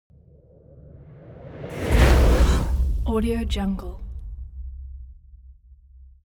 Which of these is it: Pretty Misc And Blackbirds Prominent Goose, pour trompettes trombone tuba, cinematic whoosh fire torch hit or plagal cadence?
cinematic whoosh fire torch hit